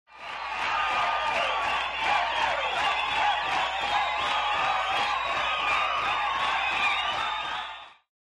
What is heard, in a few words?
Large Crowd Claps